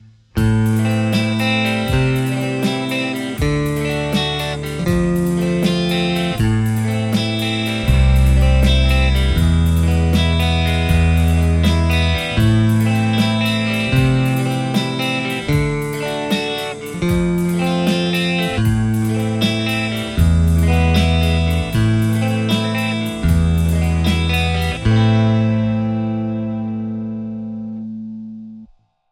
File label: tele JCM800 hot; mercuriall